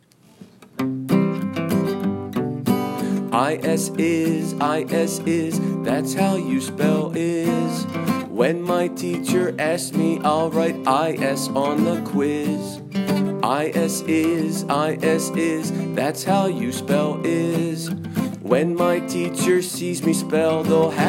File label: Jingle Bells